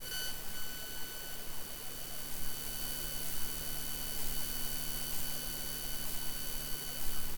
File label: Electromagnetic; InMotionAudio; Electromagnesis Samples Volume 1